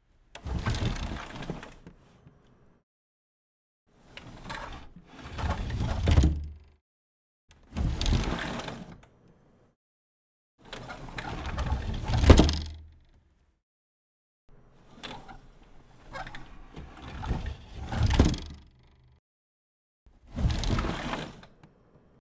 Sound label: Window